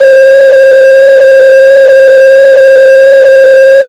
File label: pitched noise c3